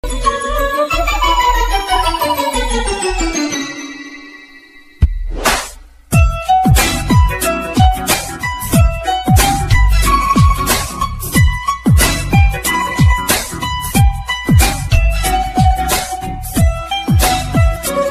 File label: kannada romantic bgm ringtone; sainika